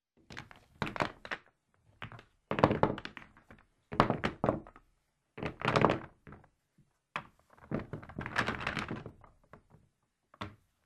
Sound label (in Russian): manipuliatsii